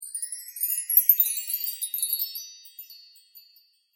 u chimes

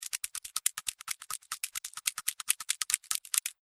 film clack double, 140; Fake Film Clack at 140BPM - The Elmo cine film projector didn’t sound “real” enough, so I added some extra film clack; regular rhythmic clicking clacking flicking plastic slapping flipping

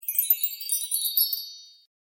chimes short, windchimes

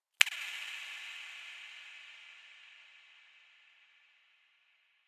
drama 120 click

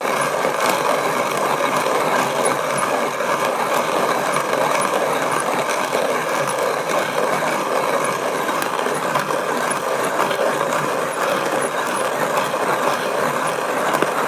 grinding loop

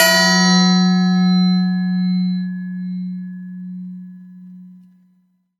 clang; bell, bong, clang, clanging, gong, metalic, plate, ring, ringing

